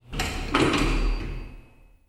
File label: elevator stop